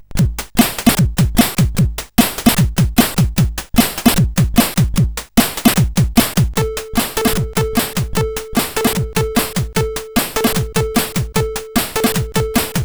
Ting ting ting, CSG8580R5

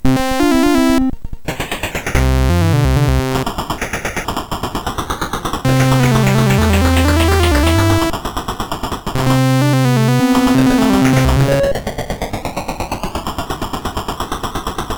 Train, MOS6581R4; hvsc

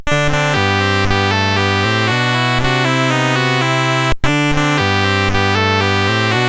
BASIC sid CSG8580R5

